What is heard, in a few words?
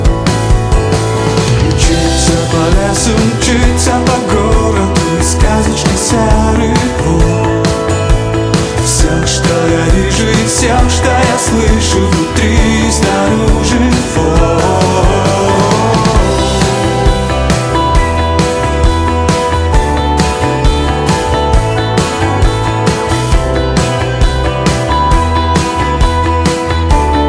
folk